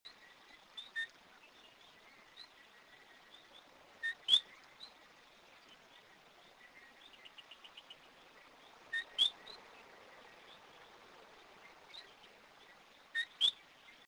eleutherodactylus schwartzi mount sage tortola british virgin islands; two-note call; rather weak; West Indian